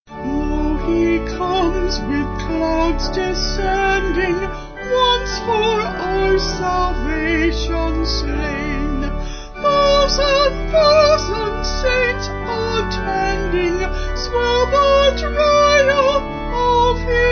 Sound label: Vocals Organ; Sung Lyrics; Lo He Comes; St Thomas